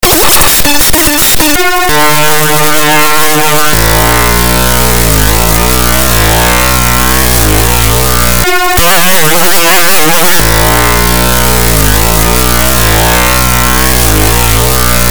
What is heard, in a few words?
heart